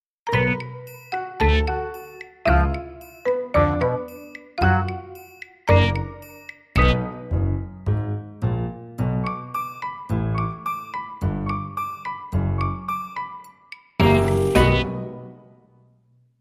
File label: Quirky Trans